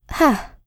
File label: laugh; Eternal Return; Katja; ENG